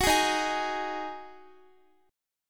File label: Eb Minor Double Flat 5th, Guitar; Ebmbb5; strummed